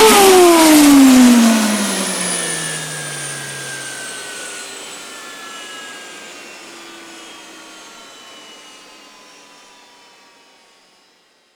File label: Aircrafts Engines APU AI9V Shutdown; DCS